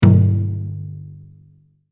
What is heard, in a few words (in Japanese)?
太鼓